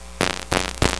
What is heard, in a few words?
Default Beep